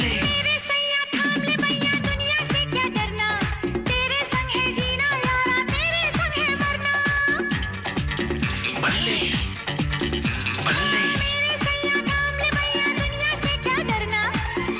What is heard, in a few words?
Song Ringtone